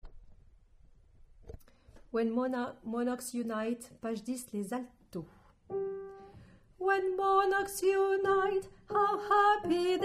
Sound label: Alto; When monarchs unite - Purcell - Didon & Enée - 05